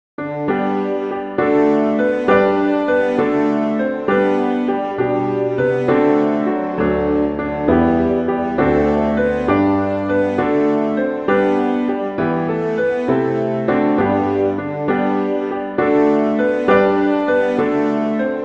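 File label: I Saw Three Ships, Christmas flute quartet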